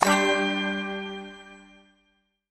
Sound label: succsess